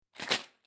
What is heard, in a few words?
Coin Chest Rattle, Shake little metal tin / plastic tin with coins inside; Money Sound Effects; LynneMusic